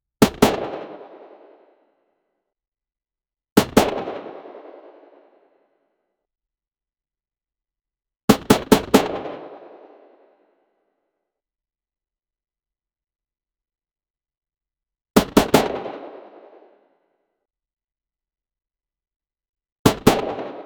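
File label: variations of a bolter sho, fictional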